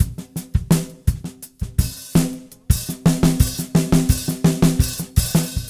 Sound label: Beat Loops; Zero-G; Monster Beats